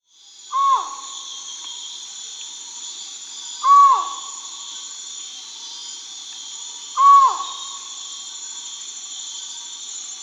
Collared Forest-Falcon (Micrastur semitorquatus), Peru; S. America